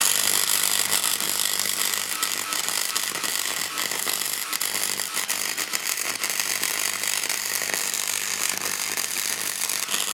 lightning attractor discharge; Factorio

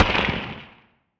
asteroid damage carbonic small; Factorio

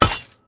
axe mining iceberg; Factorio

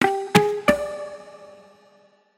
New Objective, music; Movie

